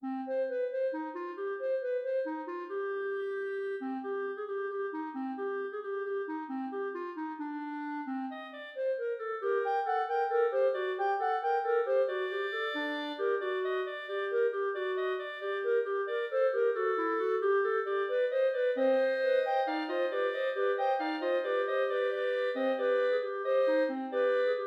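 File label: fugue clarinet trio; mattheson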